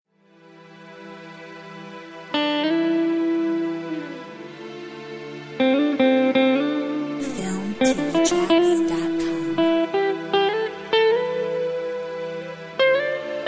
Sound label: stary night gtr